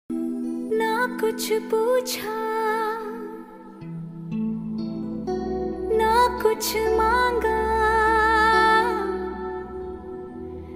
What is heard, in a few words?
Most Romantic Hindi Song Ringtone